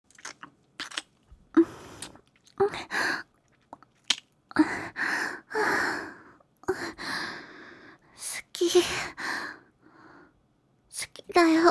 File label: kiss